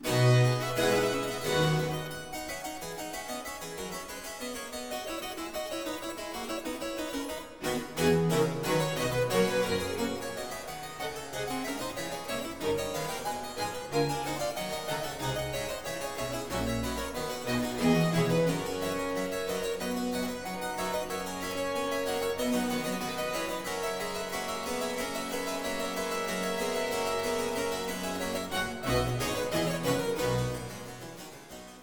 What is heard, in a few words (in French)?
bach concerto pour 2 claviers; BWV 1061